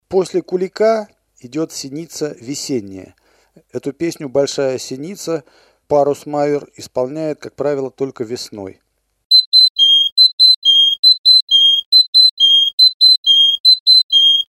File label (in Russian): siniza ves